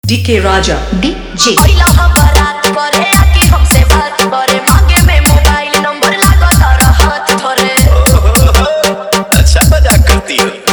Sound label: Bhojpuri Remix Song